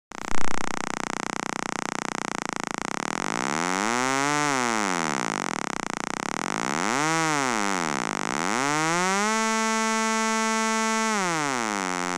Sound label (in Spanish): VCO oscilador Saw variando freq